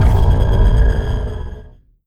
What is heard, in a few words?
railgun fire; weapons; Stellaris